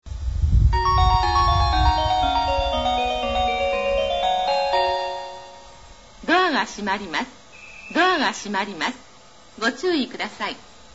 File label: sakuranomiya train the inner circle starting bell; nishinihon